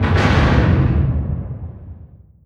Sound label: distance heavy cruiser attack; Hearts of Iron IV Death or Dishonor